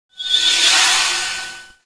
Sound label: Sci-fi Transition